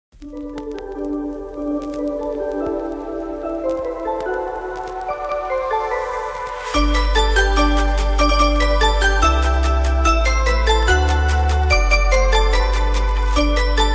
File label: Jingle Bells Remix